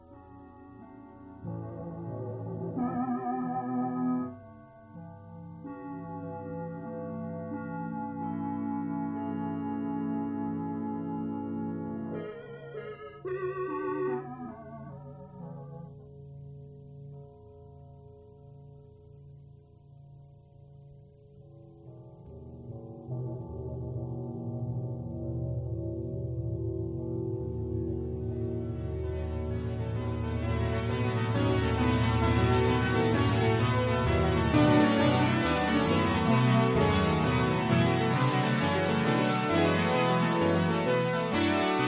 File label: harp clarinex